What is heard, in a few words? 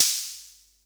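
SR95 snare drum; Best Service; Dance Mega Drums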